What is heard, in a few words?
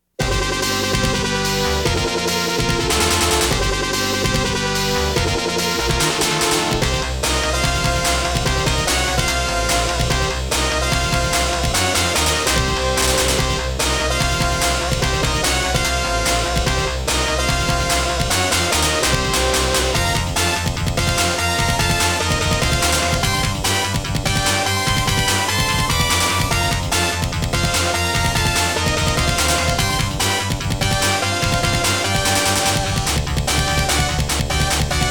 Stage BGM